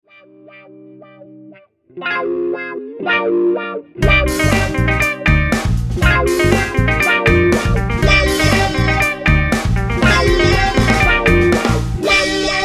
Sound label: jam jingle